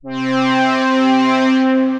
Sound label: Flyover Split, Synth Pad; Abaya Flyover Split; Ableton; Factory Packs; Synth Essentials